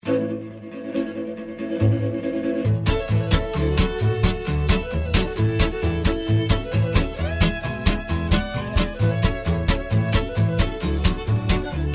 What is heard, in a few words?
Square Dance Music; Patter